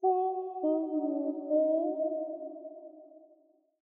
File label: Mumbler